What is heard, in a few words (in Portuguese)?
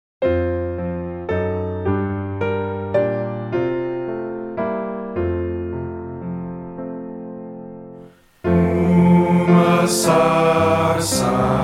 voz piano